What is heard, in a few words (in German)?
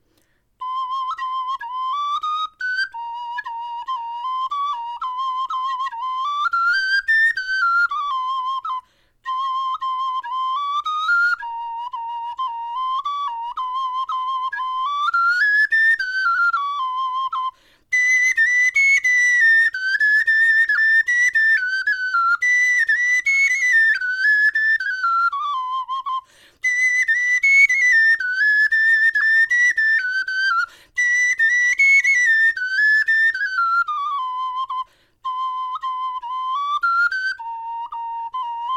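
melodie, Knochenflöten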